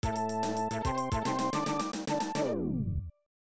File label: Unused Cinematic Fanfare